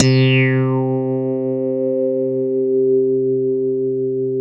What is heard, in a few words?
JV-1080